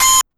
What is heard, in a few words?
Suit Alarm Loop; Alarms , Sirens, and Horns; 'The Martian'